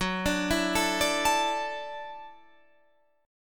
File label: Gb Minor 7th Sharp 5th Gb Guitar Half Step Down; Gbm7#5 arpeggiated